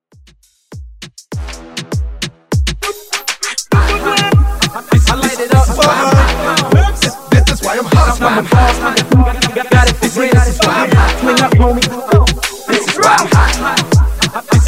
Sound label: Moombah Hype